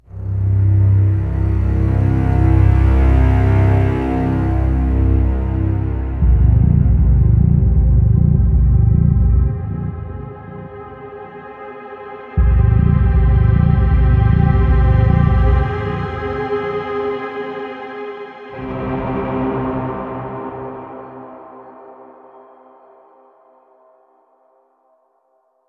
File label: Suspense Oneshot